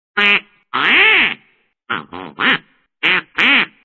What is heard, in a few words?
Ducks; midi